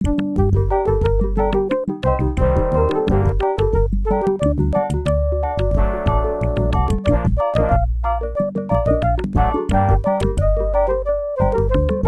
Floppy Scumm bar; Monkey1

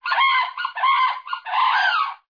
goose call frenzied; Frogger 1997

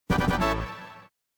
beta music

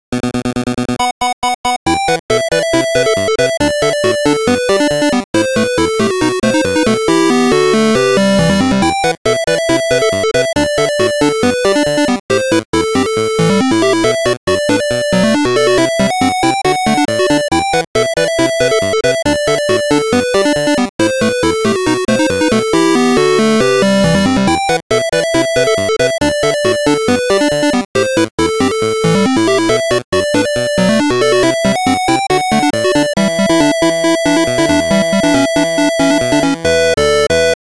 BGM Beat Hit; Digimon Battle Spirit

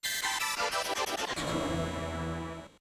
jingle; Neugier

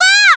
Damage Fly; SSB Brawl; Popo

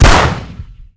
pistol fire; FO3

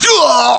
Damage Fly; SSBBrawl; Wolf

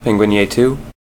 Penguin Yay; CTR

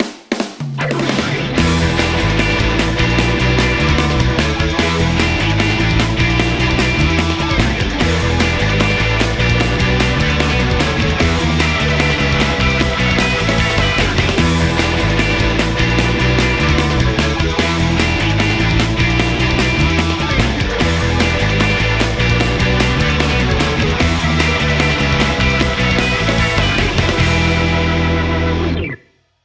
Car Get Loop; Gran Turismo 5; GT5

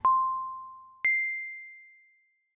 Test Tune; Mario Party 2

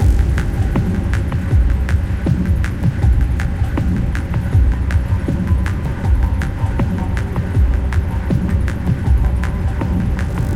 Low Drums; Mirrors Edge

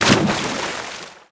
ambient water splash; CSS

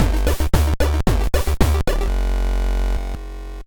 dance; Shantae

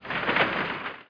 ROCK FALL FLOOR; Frogger 1997

